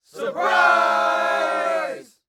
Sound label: Human Crowd Surprise